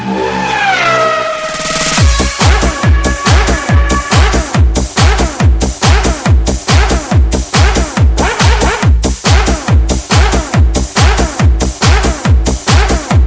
Racing Remix

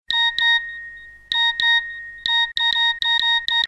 iPhone 13 Pro Pipi

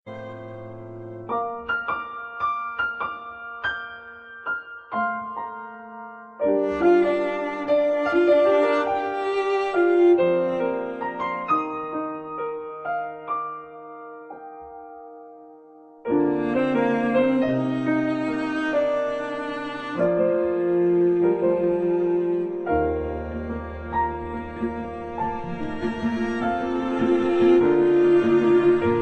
Instrumental

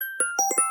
iphone circles

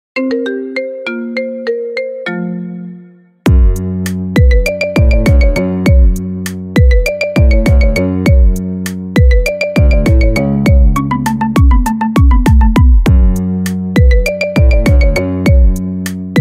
Wow Marimba

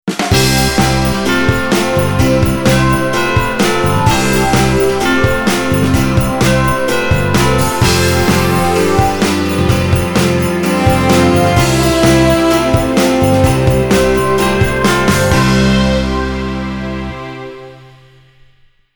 Magic Christmas

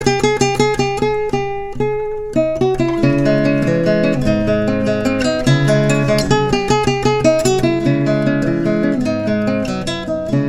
Samsung Galaxy S5 For Elise

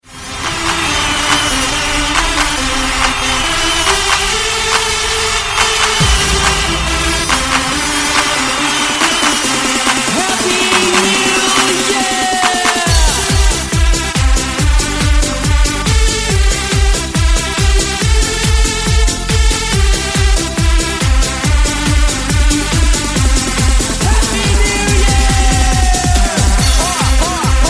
Happy New Year Clubstyle

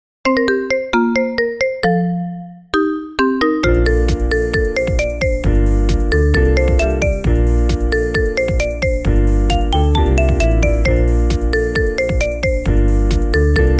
Marimba

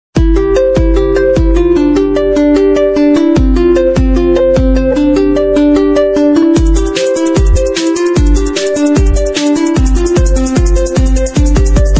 Redmi Note 9 Pro Max Original

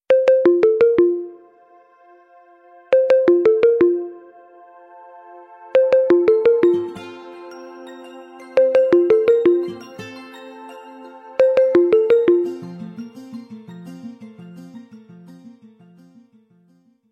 OnePlus 9 Pro 5G Original

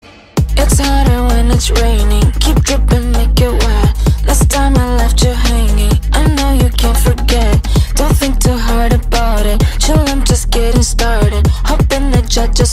Funk

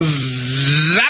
Zzzzzzap

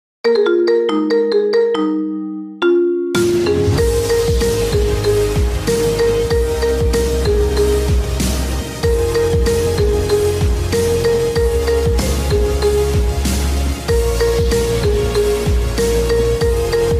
Marimba

